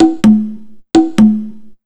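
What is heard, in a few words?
127 drum 2 R, Fresh Disco House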